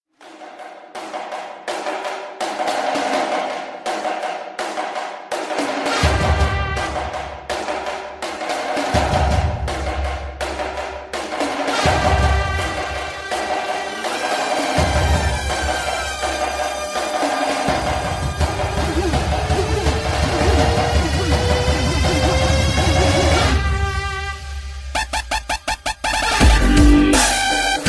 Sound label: ghost bgm mass bgm ringtone mass bgm police bgm; theri; theri movie; thalapathy vijay